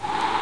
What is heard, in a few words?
button click; Naked Texas Art - James W Johnson